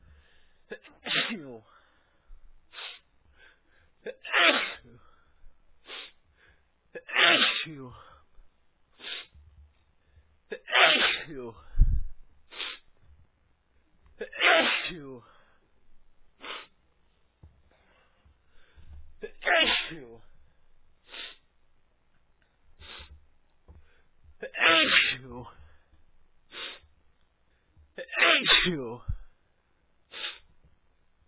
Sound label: aashooo, male